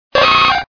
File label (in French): Cri